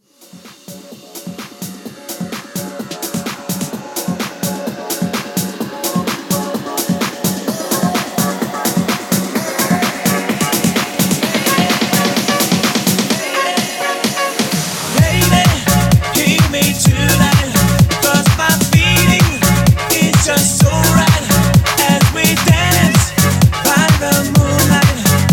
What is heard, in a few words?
128bpm